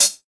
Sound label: smooth hi hat sample c sharp key; hits one shots; hat samples